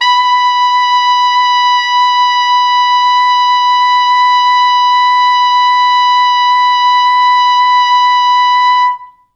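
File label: tenor sax susvf ext. range, B4; East West; Quantum Leap Horns Sax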